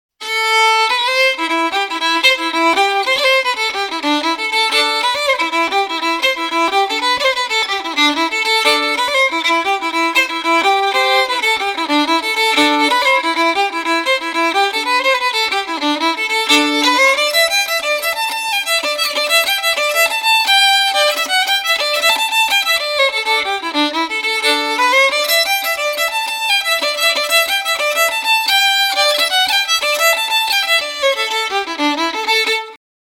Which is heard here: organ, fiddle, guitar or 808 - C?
fiddle